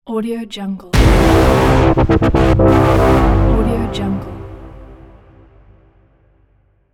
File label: future horns; musicalyptica